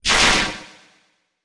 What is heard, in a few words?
enemy attack